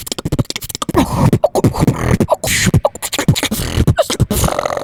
beatbox

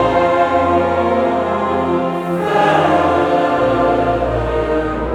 Chorus & Bass Solo

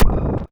Kicks

